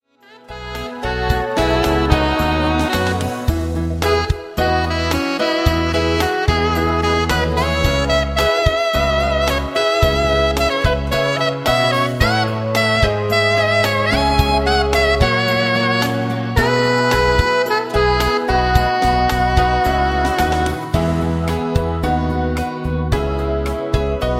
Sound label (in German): Playback ohne Floete